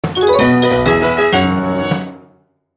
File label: New Minigame Record